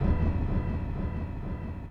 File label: ethno 125; eJay